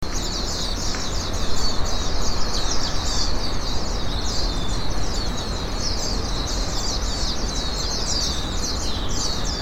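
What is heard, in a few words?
Japanese white-eye; mejiro; wakamiya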